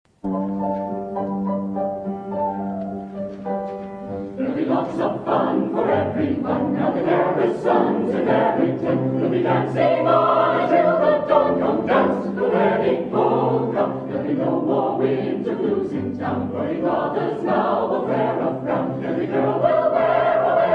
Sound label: Wedding Polka